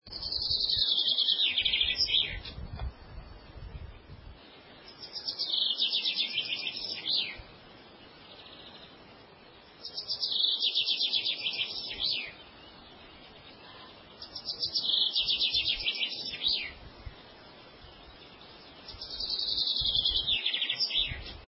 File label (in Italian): fringuello